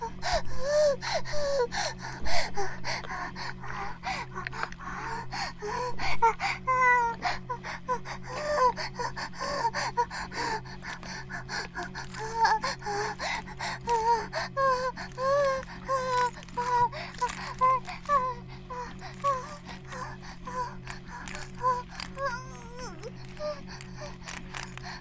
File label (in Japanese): バイブ放置; イクたびにわけて